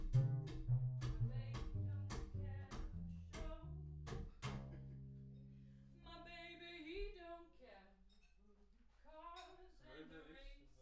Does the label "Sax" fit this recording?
No